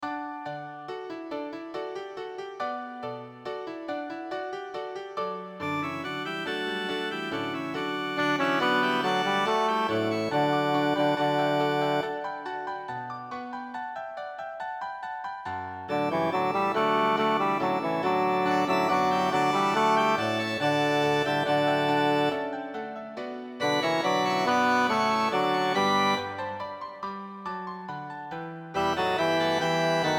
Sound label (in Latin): magnificat coro gloria patri bass; bach johann sebastian